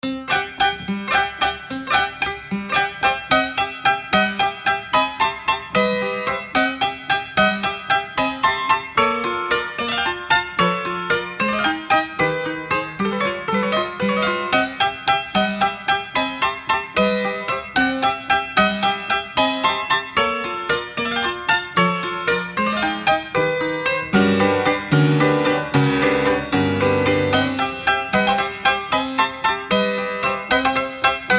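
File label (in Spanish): Música de Circo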